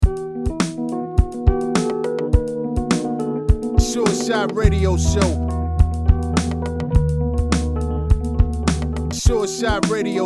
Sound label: Old Rhodes